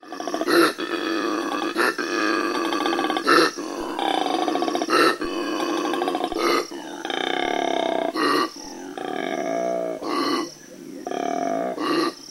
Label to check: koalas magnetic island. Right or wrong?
Right